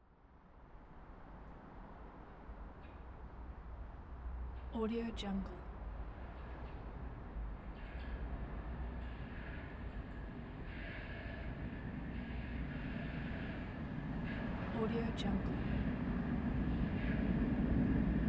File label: three trams passing by through a bridge; urban sounds